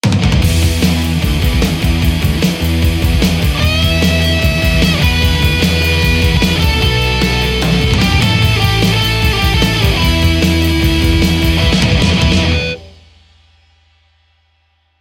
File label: creme sharpampone strat; kuassa